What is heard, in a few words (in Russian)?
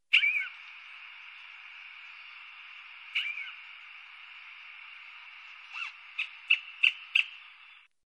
kuksha golos